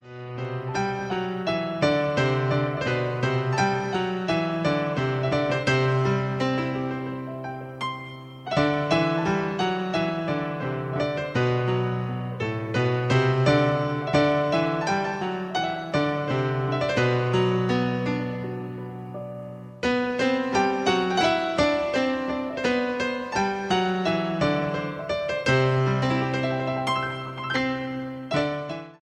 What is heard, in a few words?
piano